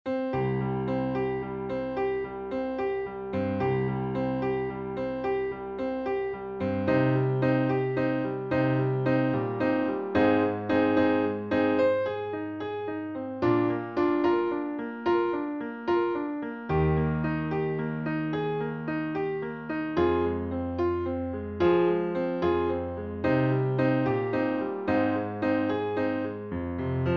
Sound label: minusovka fortepianna